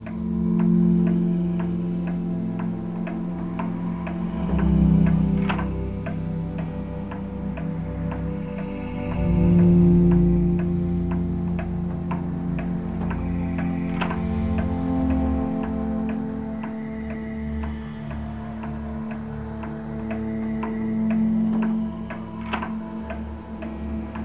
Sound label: Ambience; Incredible Adventures of My Mom